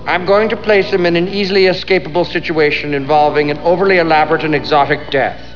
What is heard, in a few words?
death